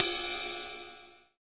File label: Ride